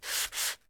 SNDPAPER, SAND PAPER; 1000fx

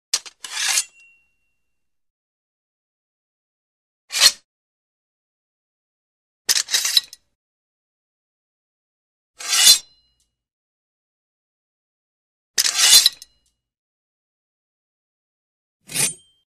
Sword Slides Into Sheath Sword Handle Movement; Hollywood Edge Foley Sound Library

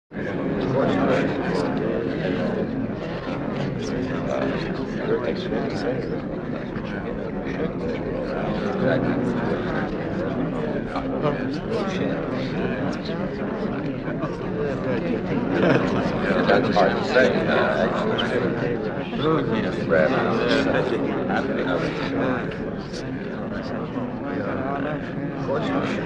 Convention Large Crowd Busy Murmur; Interior Crowds

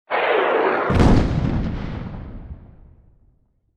Rocket Launcher Thud; DJ sounds

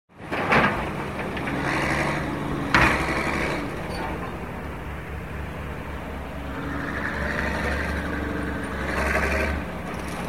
Steam shovel; SOUND EFFECTS1

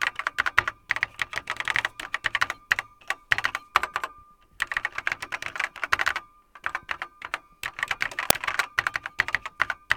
KEY TYPE, KEYBOARD TYPING; 1000fx

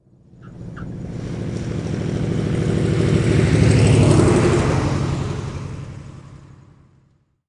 PROP BACK, AIRPLANE; 1000fx

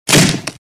Kick in Medium Sized Wooden Door; Crashes Impacts

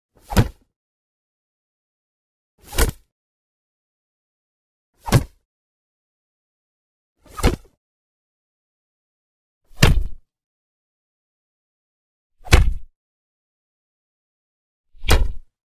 Blocks 7X, Martial Arts and Human Impacts; Hollywood Edge Martial Arts and Human Impacts

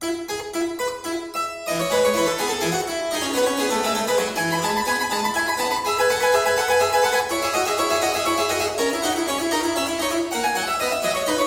Invention 8 in F Major

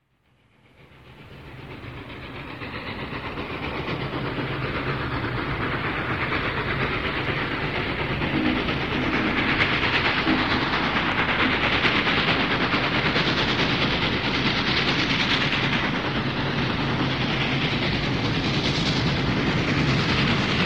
Steam Train Pass; SOUND EFFECTS1

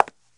CONCRETE, FOOTSTEPS; 1000fx

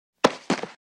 Single Body Fall Onto Concrete; Fights Bodyfalls

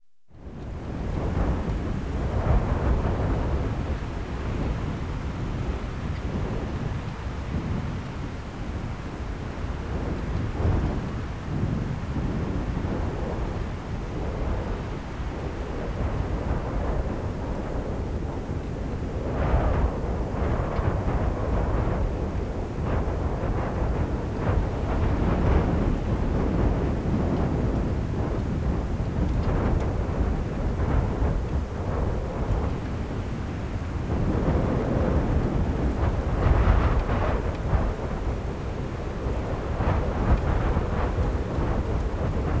Storm In the Mountains; Dr. Sound Effects; 210 Nature Sounds - 20 Hours of Relaxing Natural Ambiences for Meditation and Sleep

